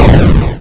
boat die

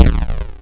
cannon fail

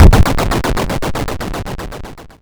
player die

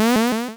combo warn

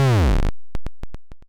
combo drop

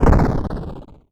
ace spawn dist

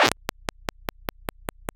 mis empty

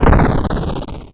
ace spawn

